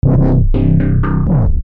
Musical Hit; Musical Hit Descend; Musical Sound Design; Blastwave

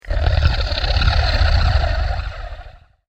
Zombie Monster, horror sound effect; zomby

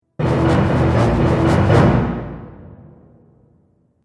Action Film Dramatic Event, musical

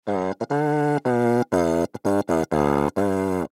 Mouthpiece Short Melody, Cartoon Music; Blastwave

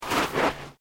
Footsteps Boots Sand Deep Step; Footstep Foley; Human

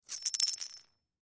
Coin Slide Single Pirate Gold Concrete; Money; Foley; Blastwave

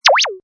Wippa, Button sound; Multimedia; Lynne Music

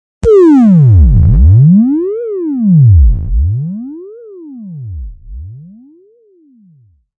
Long Zap; Zaps

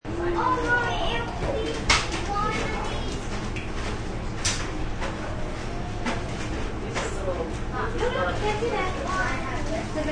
Busy Candy Store, Families inside candy store; Stores And Mall Sound Effects; Sound Effects:Backgrounds

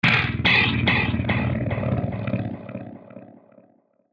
Hit Filter Pan, Hit Filter Pan Delay; Blastwave

